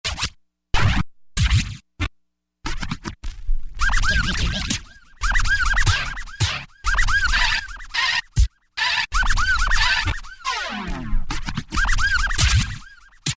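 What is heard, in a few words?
scratch; E-mu Orbit V2; Factory presets bank 0; SkrachIt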